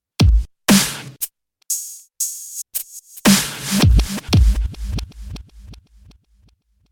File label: Dubstep Kit; KOPRO+